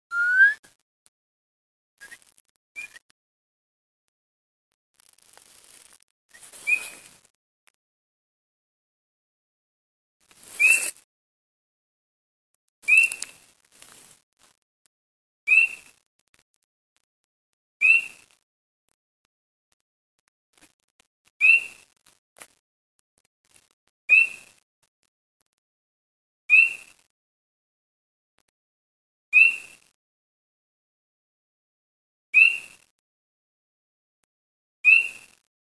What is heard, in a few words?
spring peeper